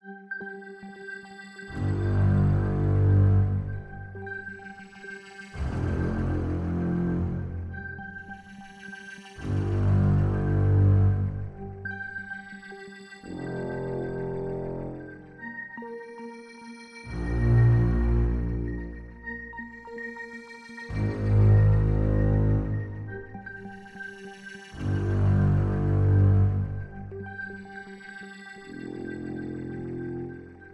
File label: Intro music Layer 125bpm; Dude Stop